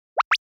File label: Motion Pop